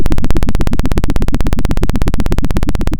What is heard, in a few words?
Sound of a helicopter